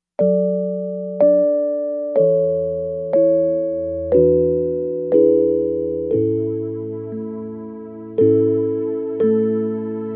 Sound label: Twinkle twinkle little stars accompaniment